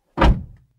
capot; کاپوت ماشین

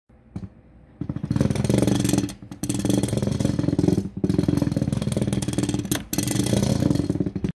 Jack Hammer; پیکور برقی

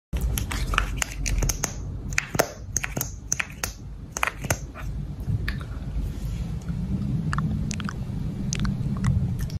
Opening and closing lipstick

